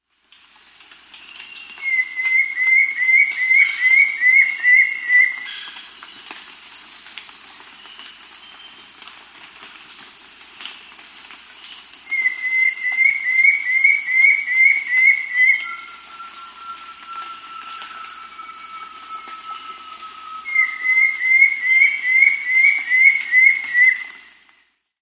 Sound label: Undulated Antshrike; S. America